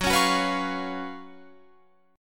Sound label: Gb Major 7th Suspended 4th Gb Guitar Open F